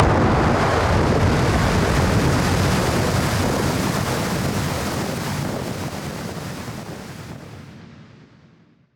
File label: Noise Bomb Harmon; musicradar; bomb factory